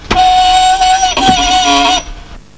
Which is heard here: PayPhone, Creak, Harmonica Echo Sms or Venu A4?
Creak